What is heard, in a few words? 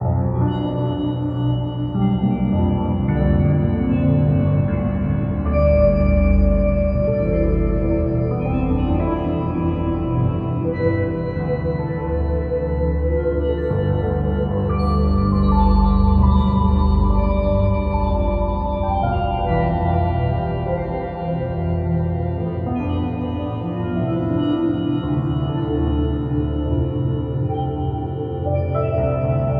Piano Theme; Sonic Foundry (Sony Creative Software); Numina II